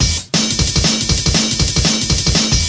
break, DnB Breaks, 179 bpm; 141 to 185 bpm; Ethex

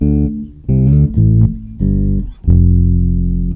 Fret bass lick

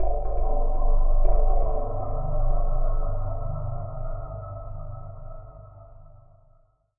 Digi Mass G0, synth

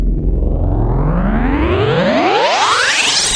Electro FX; Ueb HE Electro FX

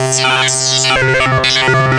Lead, Synthesizer Middle C Notes; multisampled; synth keys

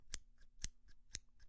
Scissors Shears

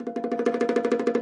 98 live bongo roll; 091 to 100 bpm

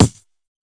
tight hit; Hip Hop; STYLE KITS (Hip Hop, Etc)

